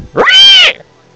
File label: WILDBOAR; ANIMALS; CMI Sound Library Rev 1.3